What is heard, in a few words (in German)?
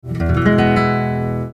Der E-Dur-Akkord, E-Dur e-gis-h; Dominant-Septakkord; Harmonielehre